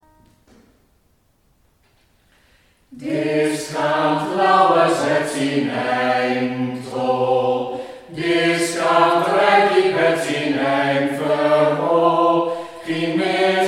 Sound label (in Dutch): Volkslied Westerkwartier